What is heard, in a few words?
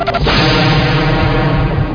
digital plasma shot